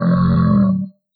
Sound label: pain